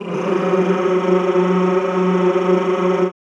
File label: CHO Rrrr F#2, Roland; Sound & Vision; Gigapack I CD 2; CHO Mmhh + Rrrr